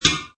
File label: Metal Ding; Metallic